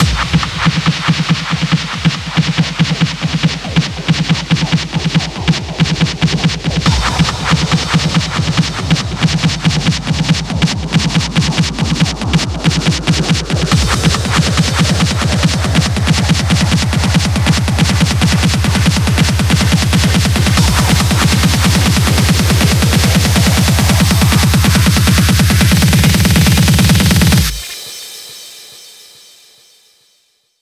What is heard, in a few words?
140BPM 16Bars, HandsUp & Trance Fills; Vengeance Samples